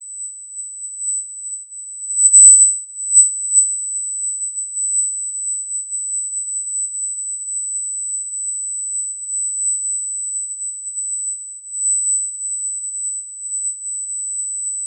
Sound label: modulating isolated tone to tv; tone to TV sky news